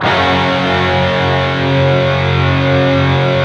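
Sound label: PRS power chord G 2 R, guitars; PWR CHRD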